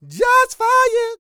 SING, Vocal Planet R&B & Dance; ILIO

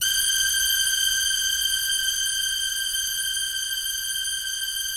BIG ORCHESTR, Yamaha VP-1; Music Store; 90's Sample CDs

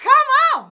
VOX, Singin

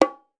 bongo; Voice Crystal - Steve Reid Definitive Percussion; BONGOS

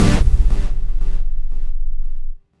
C2, Synth Line; 90 s Sample CDs; NiceBeats; SynthLine VOL-18

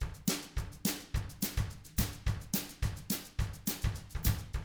TRAIN, Double Platinum Drums

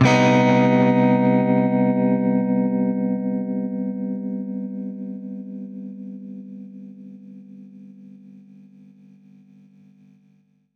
Baritone Chord Cmin[maj7], Guitar Chords; undercover samples

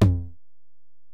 Tom, Alesis DM-PRO

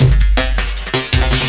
LOOP, 160 BPM, Drum & Bass

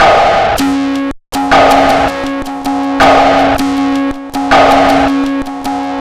Pure Tabla, 080BPM; Zero-G

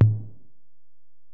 Tom, Alesis DM-PRO, Drum Machines, Toms

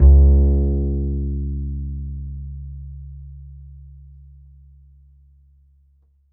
A, upright acoustic bass, A string

